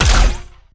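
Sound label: Laser gun Flanger Fire Heavy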